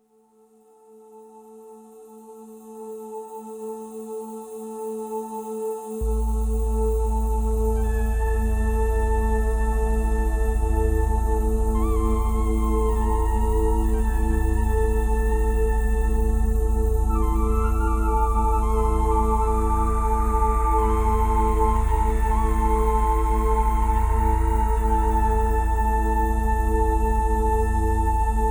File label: tabla dreams